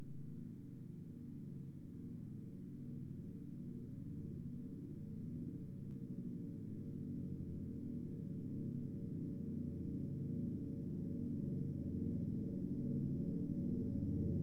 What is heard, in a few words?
scary note; Limburger